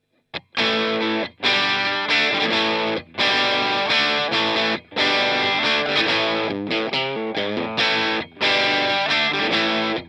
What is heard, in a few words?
lead